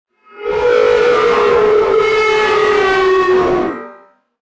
jumpscare sound; five nights at stebbys 4

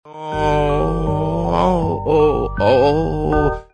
nowo wo oooo

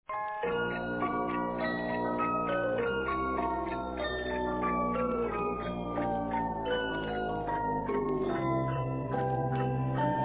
scary music

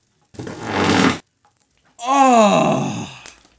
fart and relief sound

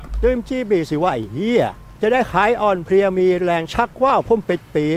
beat

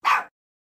bark

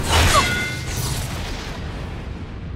blade hit and explosion

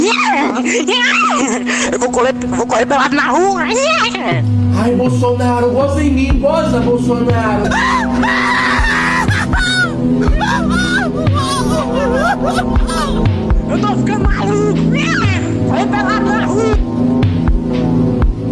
iahahaaaaaaaaaa

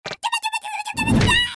cartoon boom; chukpa sfny

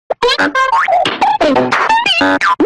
goofy ahh bouncing sounds

